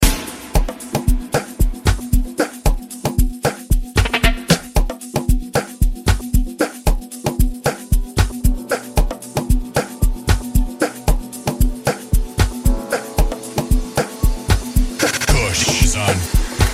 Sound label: Amapiano Remix